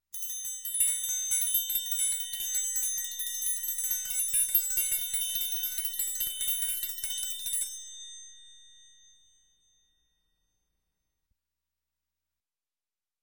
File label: Triangle ride, Music, Percussion; Sound Ideas 2000 Series